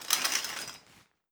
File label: Shower Curtain Close